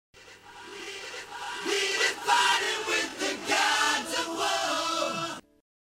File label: rocket reverse; backmasking contrario